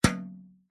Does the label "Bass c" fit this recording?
No